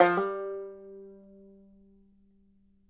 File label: BANJO NOTES, G 3; Sampleheads; Whole Lotta Country 1 & 2